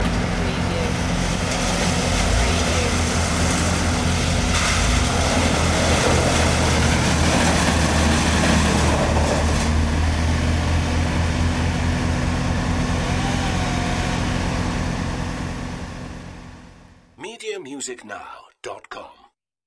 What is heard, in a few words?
Wood Chipper